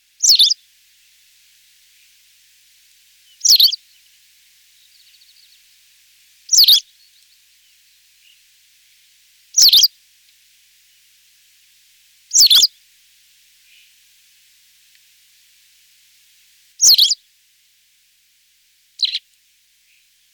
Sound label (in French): bergeronnette; faune